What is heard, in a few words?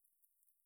Myotis capaccinii